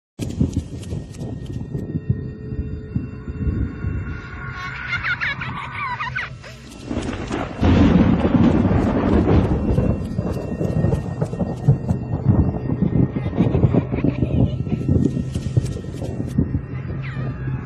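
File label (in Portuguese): fundo musical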